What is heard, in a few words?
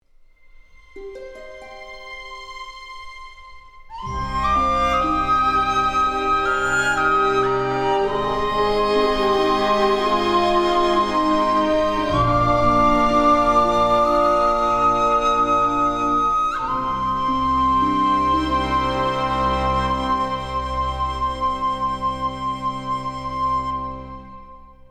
news sad bumper penny whistle violin; CTV